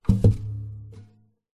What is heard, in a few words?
musical guitar acoustic nylon set down carpet